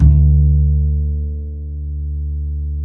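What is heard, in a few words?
double bass D; Univers Sons; Jazzistic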